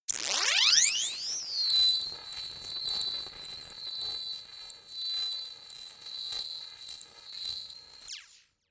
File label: crackle scanner long; soundpool